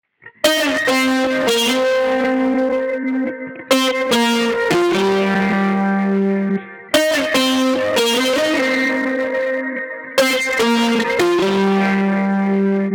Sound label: Fuzzy String Wet; distoblast